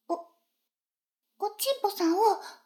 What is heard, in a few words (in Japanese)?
シコシコ音声; 逆時間停止されて